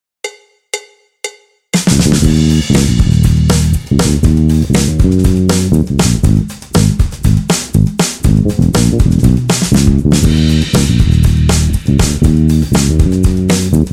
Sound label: Center time with laid back drums